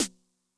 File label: SNARE DRUM Med Tune Low Tone, 909; classic machines; 909mod